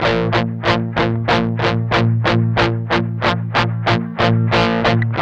MED ROCK 8TH B 2, Guitars; Spectrasonics; Hans Zimmer Guitars VOL-1; 102-MED ROCK

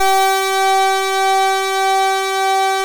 EMU MODULAB, MODULAR SYN; Sonic Reality; Vintage Timetraveler

